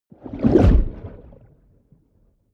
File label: whoosh submerged abstract fast short